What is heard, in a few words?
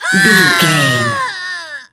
voice female death